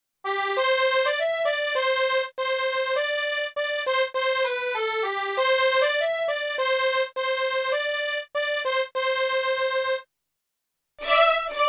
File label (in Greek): Τέσσερα αποσπάσματα του γάμου